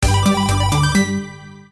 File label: win; Golden Joker